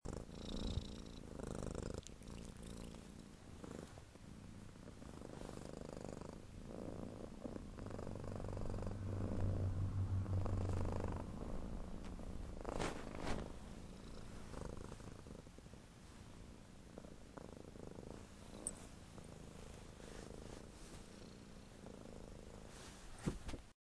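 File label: purring, cat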